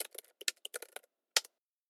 Keyboard

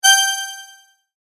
sword

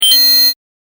coin

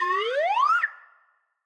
whistle UP

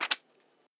paper

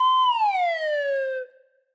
whistle DOWN

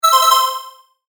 correct; クイズ番組で使われそうなピンポン。; 正解の音・ピンポーン

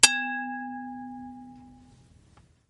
bell

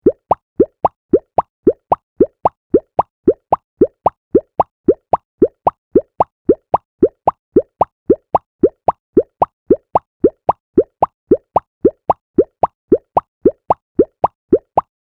anime walk

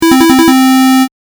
correct; ３連続の正解・ピンポンピンポンピンポンの超高音ver; 正解の音・ピンポーン

